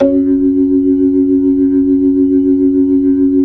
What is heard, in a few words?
B-3, Jazz